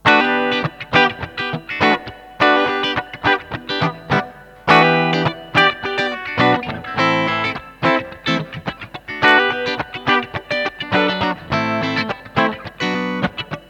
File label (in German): Steg PU clean